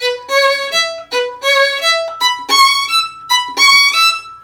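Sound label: VIOLIN LOOP, Asian Traditions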